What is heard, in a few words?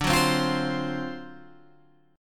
D 7th Sharp 9th, Guitar Open G